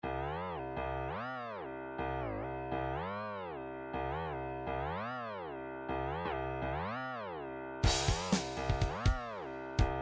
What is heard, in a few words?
triphop; Newtronic Triphop Construction Kit